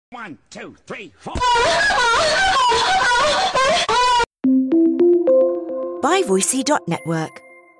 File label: CLAPPING; SANDY CHEEKS